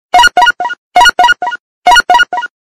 Electric Ring

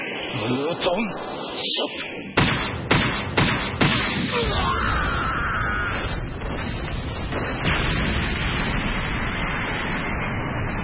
Shot

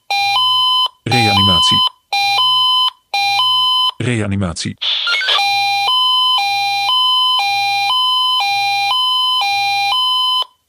Reanimatie CPR Alert

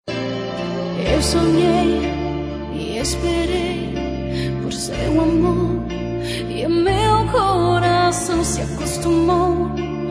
Slow Song

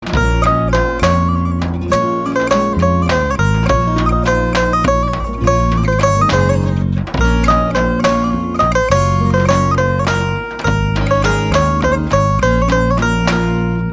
saz

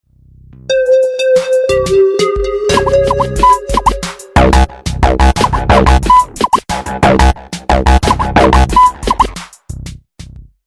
Mix Electronic; Alter

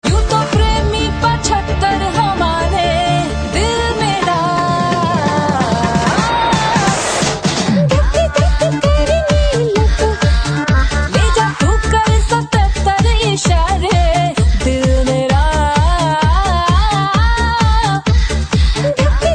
Dirty Mashup

